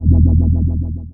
warble bass

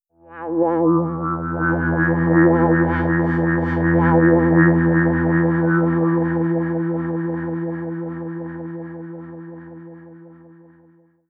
fx synth

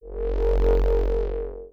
fx synth

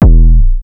kickdrum

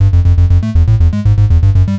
bassline

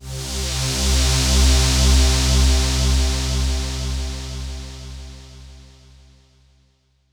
fx synth

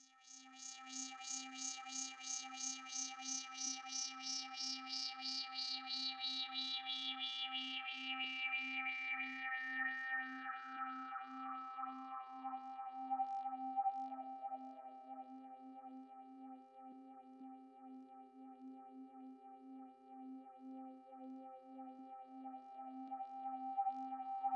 pads

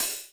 hi hat open

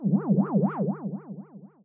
fx synth

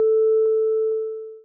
trance lead

